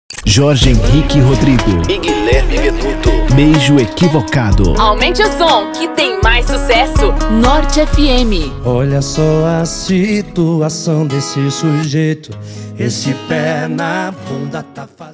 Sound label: intro music power